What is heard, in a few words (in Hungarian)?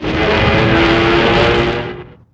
zuhanas; Pure Pinball